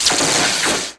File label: lightsaber hit; weapons